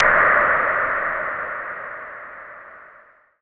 FX SHOT TEKNO 140; Techno2; Singleshots

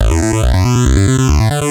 TECHNO BASS 140 A

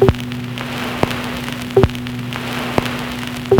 GROOVE; JUST FOR FX

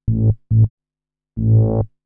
SNTH BASS TEKNO 140 A

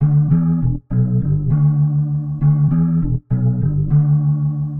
Warped Bass, 100 D; Loops; Space Starter; Touch Loops; CosmicFunk

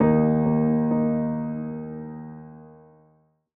F Keys, One Shots; Keys & Synths; Touch Loops; BlissedOutBeats

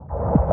One Shot, Hits

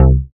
BASS STER.

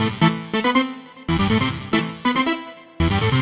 TECHNO SYNTH 140; Keys

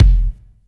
DEEP BD, bass drum; BASSDRUMS

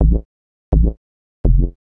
HOUSE BASS 125 A

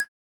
Glitch, One Shots, Glitch FX

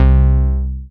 BASS MONO

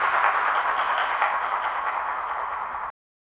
techno vocals 140

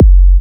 Minimal Kick, Drums, Kicks

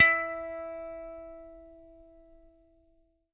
PICK E4, Bass; Good 80 Pick; Bass Mace; DirectWave

